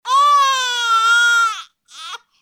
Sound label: Baby Cry